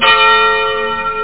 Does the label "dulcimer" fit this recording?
No